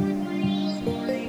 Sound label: Bweep Boop; Solos